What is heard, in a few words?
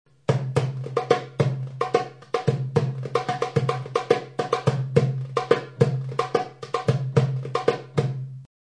darbuka